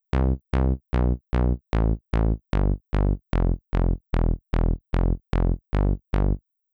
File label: Bass; Canon